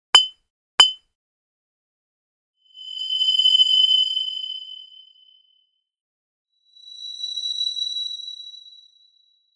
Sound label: light sound design from glass